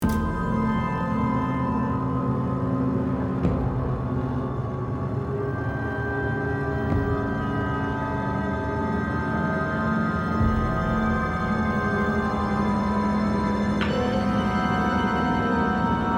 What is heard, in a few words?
Tense (Loop); the walking dead the telltale series